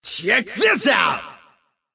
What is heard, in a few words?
Mob Voice Bad Brawler Skill